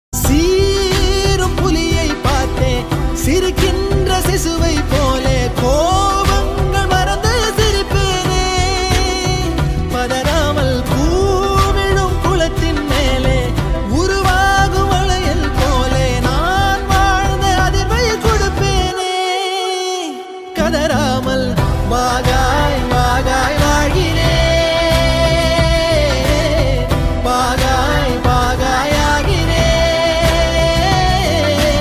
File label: song telugu; journey songs